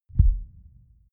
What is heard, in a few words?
synth low boom; whooshes rises; hits impacts